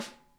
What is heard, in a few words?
SNARE, Drum Kits